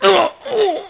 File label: DYING; BattleSNDS